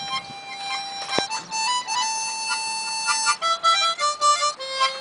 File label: Harmonica